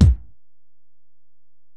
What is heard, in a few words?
Ac BD, Alesis DM-PRO; 300 Drum Machines